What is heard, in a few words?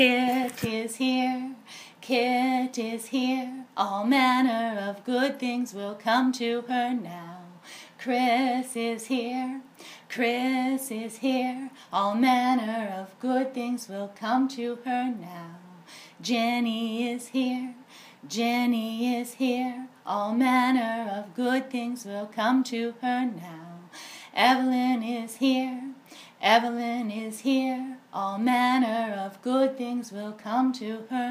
welcoming song